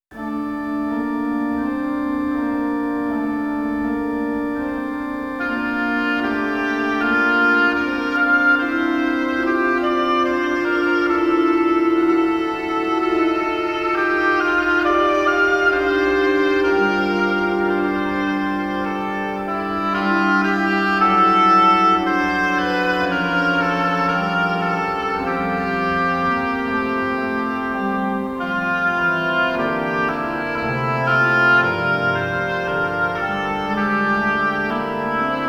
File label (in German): Variationen - Oboe